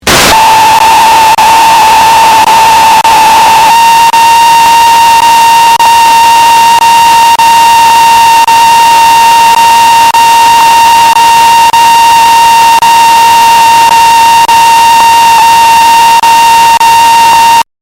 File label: the loudest static ive heard in my entire life